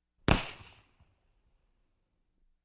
pistol one shot

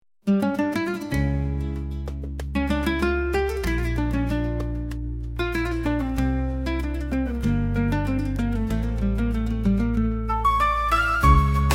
oasis revelry, arabskaya

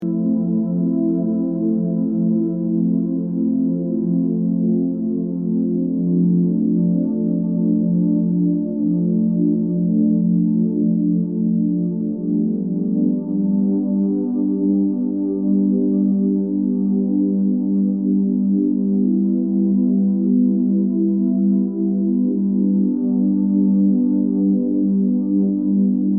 serenity by the stream, ambient

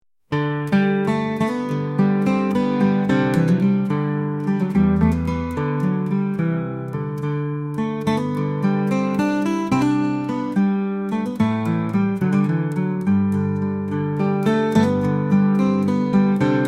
bagpipe reverie

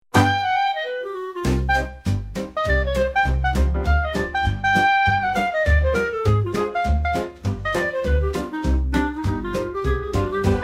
celestial clarinet cheer; jazz

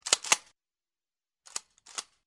reloading the hard drive; zvuk perezaryadki

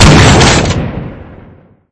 shot with a noisy echo; zvuk obreza

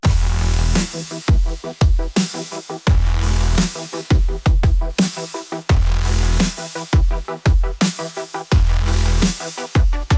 cybernetic pulse, bass music

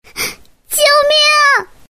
little girls cry

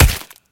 slashing blow with brass knuckles in the face; holodnoe oruzhie

